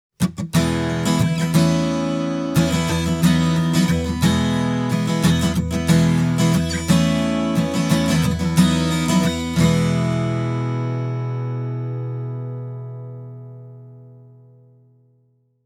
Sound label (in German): Westerngitarren Noir N1 solo; Rockway